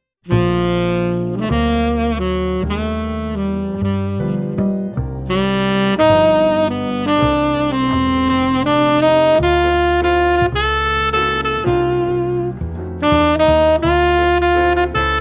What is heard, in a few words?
ensemble, jazz ensembles